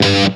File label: METAL SINGL; East West; Global Sounds